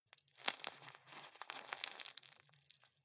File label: juggle squeeze